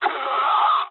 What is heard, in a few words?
jp aircraft destroyed scream, speech jp